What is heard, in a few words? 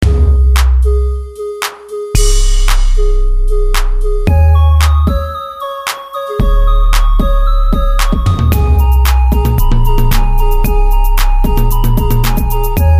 rnb kit